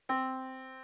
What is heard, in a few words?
yamaha Disk